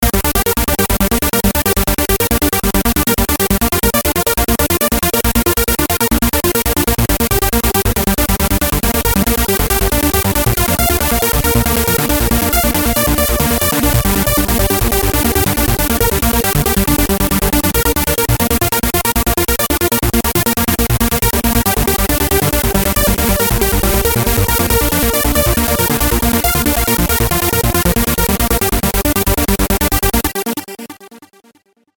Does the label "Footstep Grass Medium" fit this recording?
No